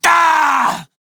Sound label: Death Male; Dialog; en; Warframe; Railjack Crew; Red Veil